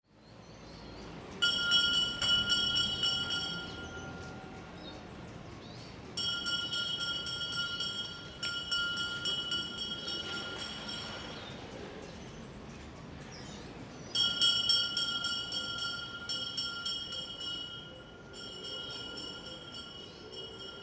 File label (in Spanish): CAMPANA BASURERO EN BARRIO